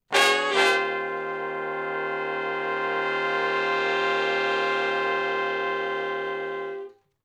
Shf Blus Thick End Chds [bII, I] (Eb), Horncraft for R&B; Sonic Foundry (Sony Creative Software); Sections